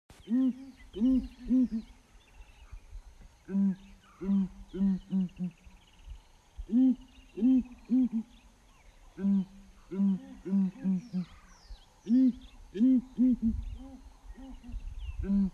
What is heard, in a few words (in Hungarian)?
kaffer szarvasvarjú hangja